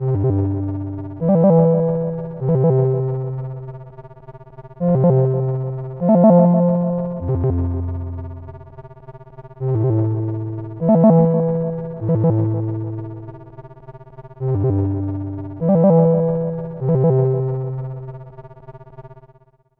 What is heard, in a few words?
neon, alarm